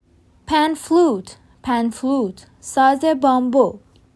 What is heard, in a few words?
Panflute